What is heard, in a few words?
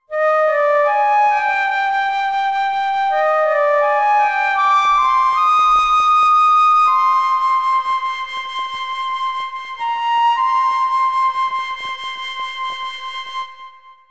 musician song of mourning; Summoner 2; PS2; cutscenes